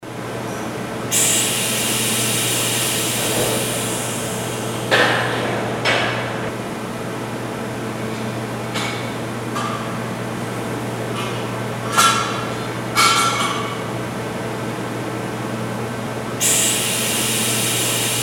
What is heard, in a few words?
machine hall fx